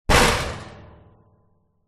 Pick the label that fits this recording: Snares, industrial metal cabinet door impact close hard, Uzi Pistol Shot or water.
industrial metal cabinet door impact close hard